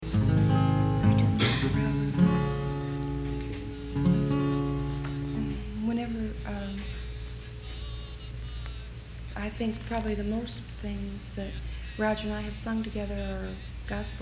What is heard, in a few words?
Applause